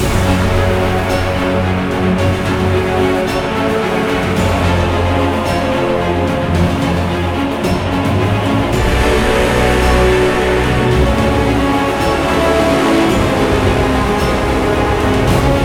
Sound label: hard battle; Magic GameClient